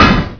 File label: HIT WALL; win95